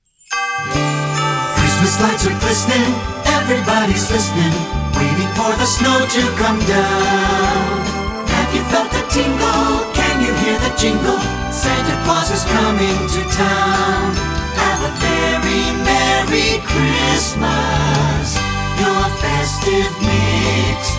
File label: Christmas Jingles